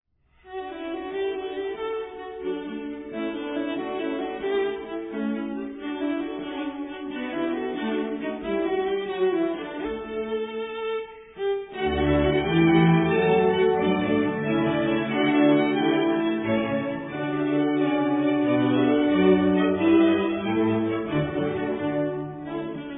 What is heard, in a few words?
Cello Concerto in D major; Joseph Haydn